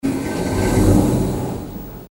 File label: elevator door opening